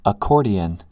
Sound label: accordian